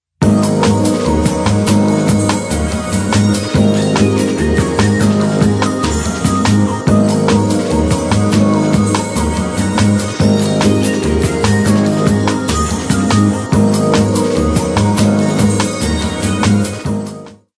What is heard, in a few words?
Lounge; Motorola ROKR E1